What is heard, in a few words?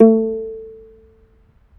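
African HARP A 4; AFR. HARP1; Heart of Africa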